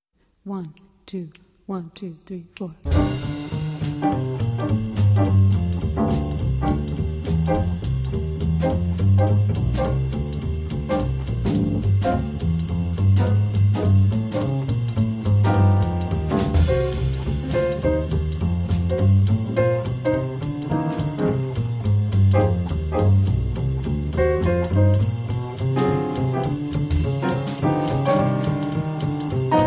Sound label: 12 bar Minor Blues